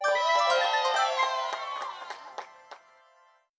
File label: game complete effect